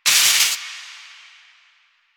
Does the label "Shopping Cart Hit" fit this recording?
Yes